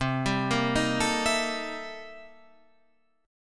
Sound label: C 13th Sharp 9th C down Guitar Standard